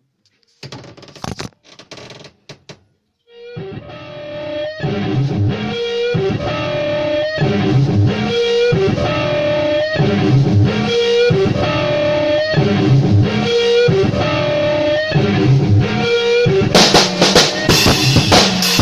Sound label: noise rock